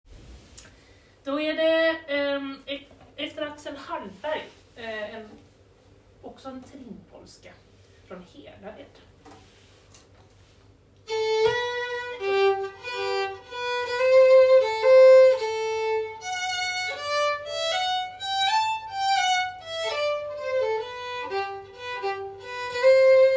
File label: Polska; Hedared